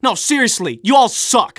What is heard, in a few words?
scout jeers